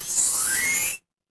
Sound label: feedback success